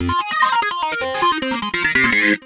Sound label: Riffin Down, Keyboards